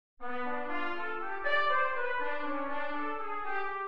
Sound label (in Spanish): Maj7 inv0 en C; El Diluvio